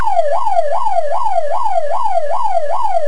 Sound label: warble